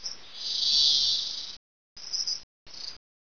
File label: scorp idle; svencoop